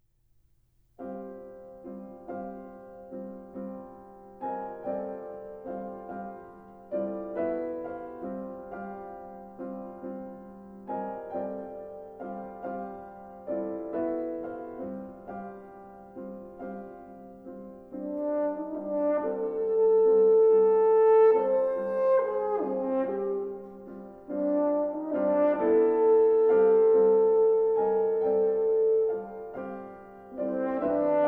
Horn and Piano, Siciliano; Sonata for Horn and Piano Op. 88

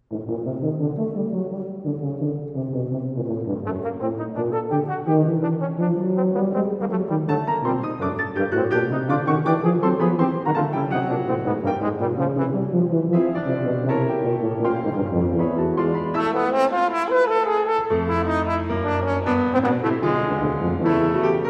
Suite for Horn, Tuba and Piano - Alla Caccia

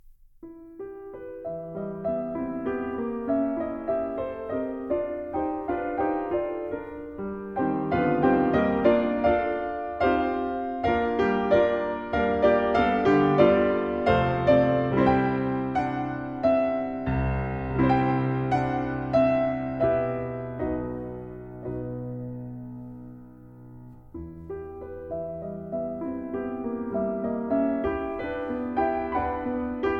Barcarolle For Piano; Martinů

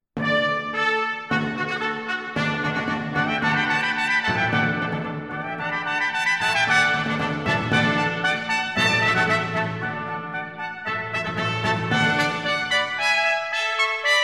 Fanfare, brass; Three Fanfares; III. Fanfare #3

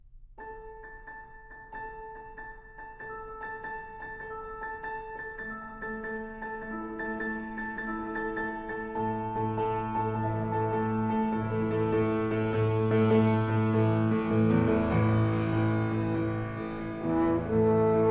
Hymn Tune Settings; Three Hymn Tune Settings - Mvt. 2. Amazing Grace

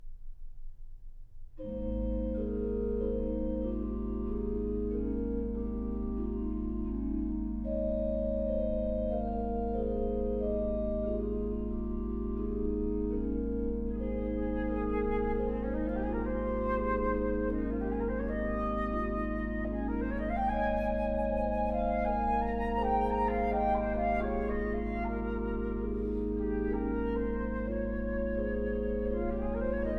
Four Psalms For Flute & Organ; III. Psalm 103

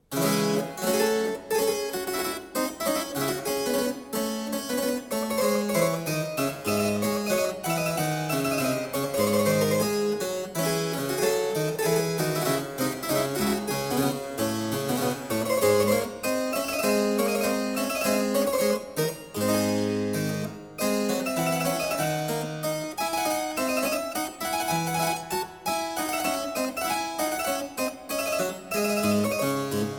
Suite I in D minor Rigaudons, Harpsichord; Music for Harpsichord

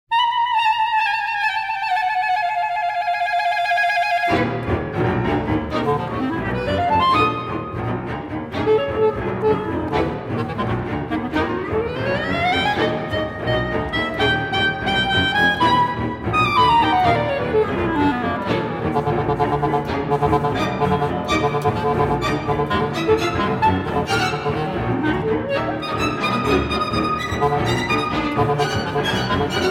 X (Concerto for Clarinet), Clarinet; Black Dog; Mvt. 3. Quarter = 126

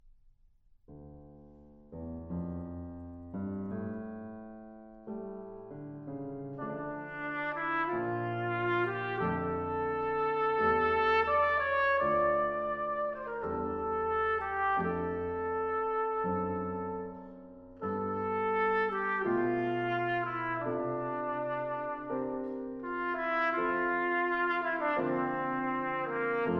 Vocalise, Trumpet Vocalise

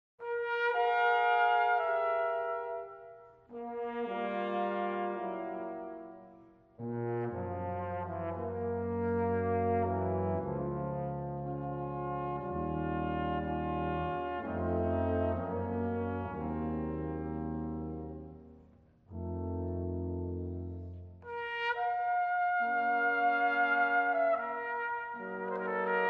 Quintet, Andante, Brass Quintet; Atlantic Brass Quintet; A Musical Voyage

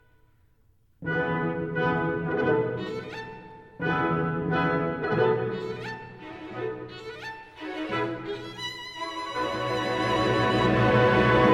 Violin Concerto, Allegro molto vivace; Felix Mendelssohn

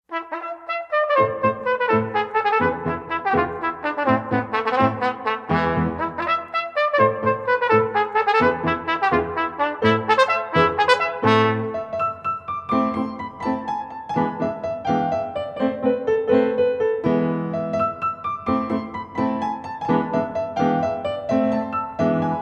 Violin Concerto, Rondo

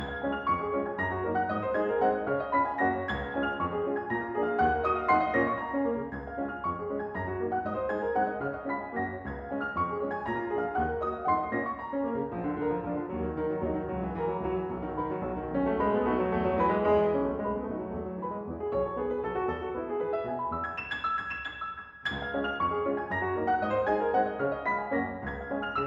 Waltzes, For Piano, four Hands, C-sharp Major; Brahms

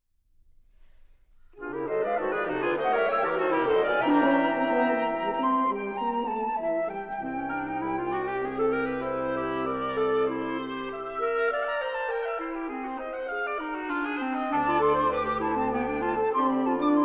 Suite Hermetia for Woodwind Quintet

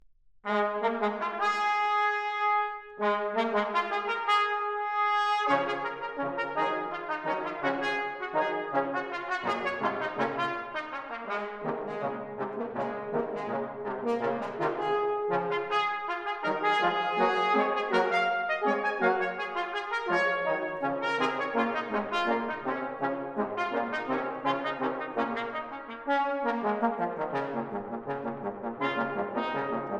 Music for Brass Instruments - II. Intermezzo

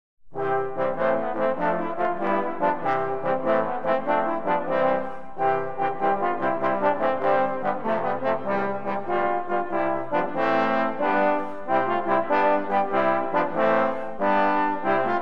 Trombones; Bring A Torch

